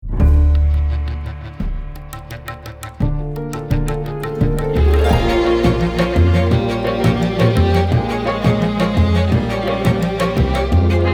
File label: Opening Sequence Score Driving West Slavic; Verliebt in Masuren